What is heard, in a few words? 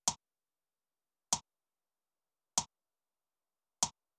snare processed